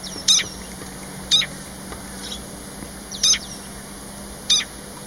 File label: Long tailed Shrike Lanius schach bentet calls Malim Nawar Perak Malaysia